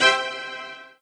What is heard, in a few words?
Striped Candy Created; candy crush saga gamerip